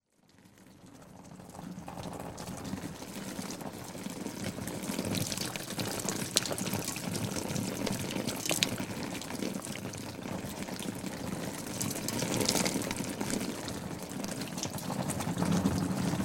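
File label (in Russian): капли по шлему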